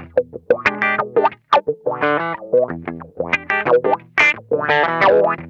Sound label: CROAKIN, Funk Guitar; Zero G - Funk Guitar